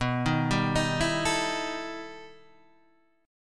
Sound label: E Minor Major 11th B, guitar